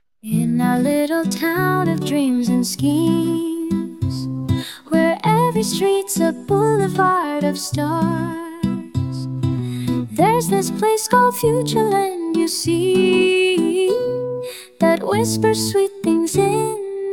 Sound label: Passiveaggressive Adult Contemporary